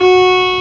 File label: alarm 8Bit; abbottabad compound